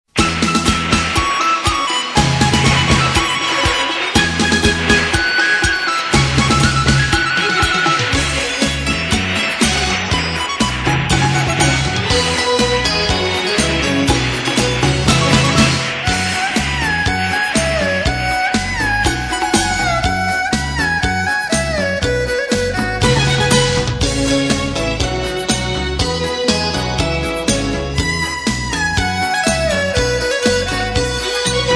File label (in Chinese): 欢快喜庆